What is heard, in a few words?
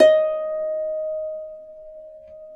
EB4 HARP; Complete Harps; USB Soundscan vol.62; AKAI